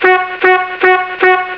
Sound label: honk